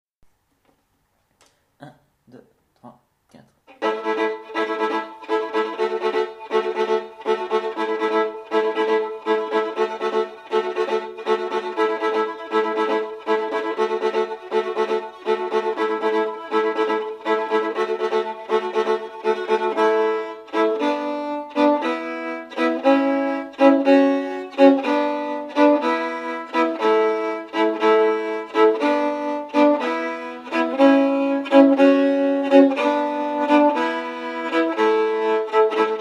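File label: Allemande 2 - accordion; Allemande 2 et amoureuse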